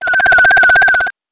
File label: randomize, 8 bits; Game Sound Solutions - 8 bits Elements